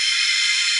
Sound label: Ball Cannon